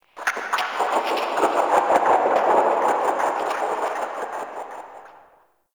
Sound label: Crackly Birds; Warframe; Shadowgrapher